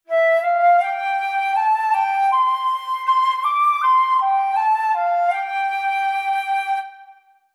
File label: Poke Flute; XY